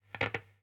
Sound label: Crackle, Radio